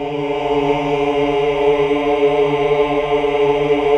AAH; Best Service Gigapack 1

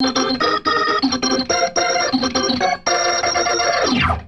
Riff; InVision Interactive; Keith Emerson; The Most Dangerous Synth and Organ; ORGAN RIFFS

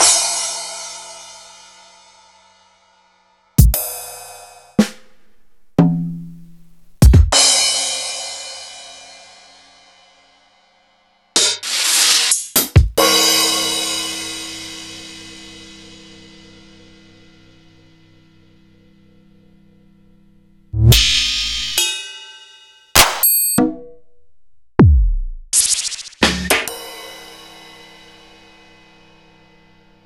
EMU PX-7; Sliced; m8

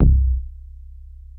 Bass Synths hits and octaves

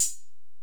Korg x5, Drums

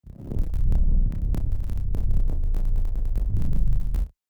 Malfo Syn, malfunctioning synth

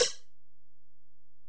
HiHat, Alesis DM-PRO

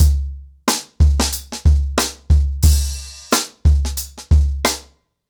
90BPM, ACOUSTIC DRUMS

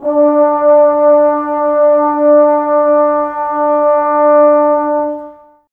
BRS TBS D, Woodwind Brass Ensemble, Brass; Miroslav Vitous - Woodwind Brass Ensemble

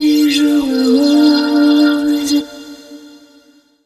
VOICE FX, Dance Vocals

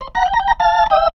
B3 LICK C; B3 PLAYED

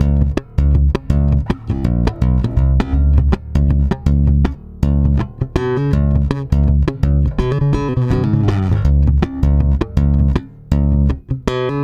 STRUM D#, Bass; Spectrasonics; Bass Legends; 88 STRUMMIN

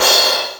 Drumulator Crash; Drums

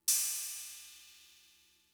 Cymbal, Fricke MFB-522, Drum Machines

